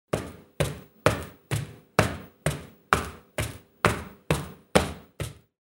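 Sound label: stomping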